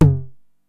TOM H, 909; musicradar-synth-samples; Classic drum machines; 909TOMS

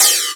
Cymbals HH Open; Vengeance Samples

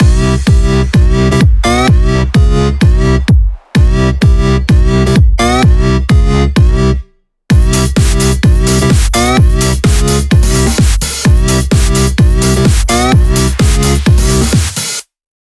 128BPM Big beat Mix; Vengeance Samples

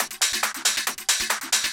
Loop 138BPM, Drums & Perc; G#minor

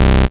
Bass C, Offbeat Bass

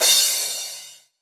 Crash; Vengeance Samples; VES1 Cymbals

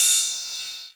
Cymbals Ride; Vengeance Samples

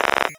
Minimal Perc; Minimal Percussion

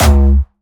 Jumpstyle Kick C#2, vengeance essential bassdrums; Jumpstyle Multis; vengeance essential club sounds-3; VEC3 Bassdrums

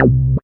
Synth Bass; Vengeance Samples; VEE Synth Shots

